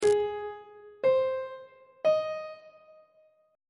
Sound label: b flat aeolian chord 7 is a flat major on treble clef